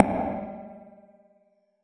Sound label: verby tone B1; Tonal; UNUSUAL KITS and FX